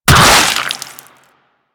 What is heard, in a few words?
Impact Gore Large; Warframe; Weapons; Bullet Impacts